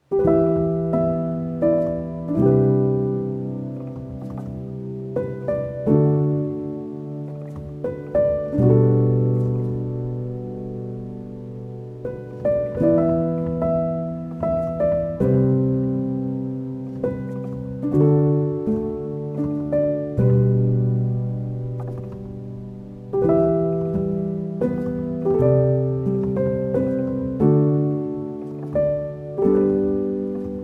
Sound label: Piano Version; The Secret World of Arrietty